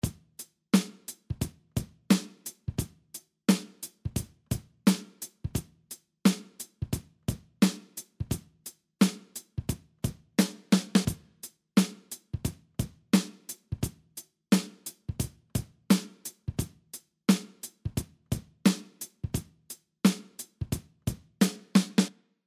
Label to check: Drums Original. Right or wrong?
Right